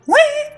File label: ouiiing